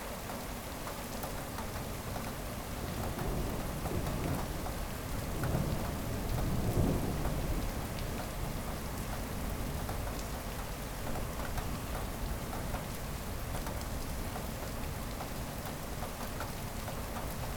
rain window; 99Sounds; Rain And Thunder

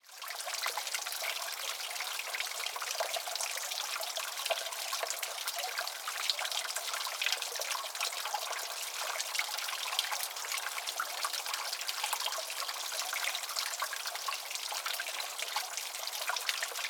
Water Drain Sewer; Soundopolis; Fienup

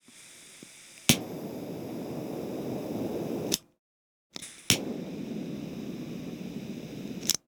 Lighter Blue Flame Light; Soundopolis; Foley Plus; Fienup

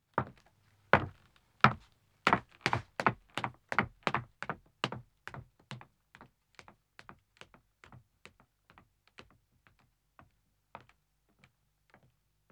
Wooden Stairs Descending, 1 Ma, Footsteps; BBC Sound Effects Library; Footsteps II

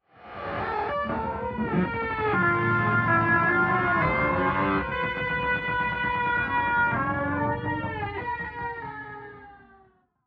Movement; 99Sounds; InterSpace

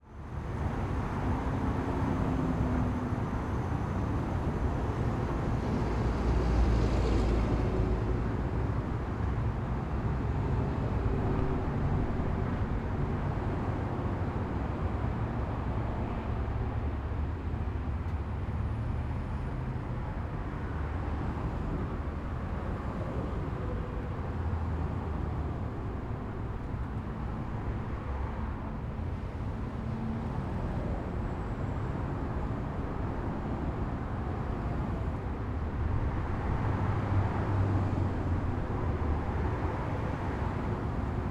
City Freeway Traffic Medium; Cities; 2496sfx

